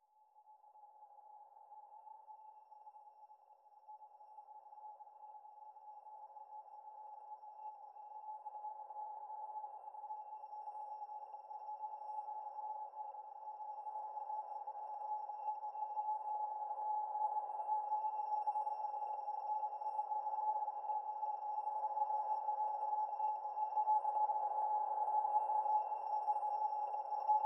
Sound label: Ambient Mix